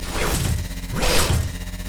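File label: emote dance loop; rumble skin03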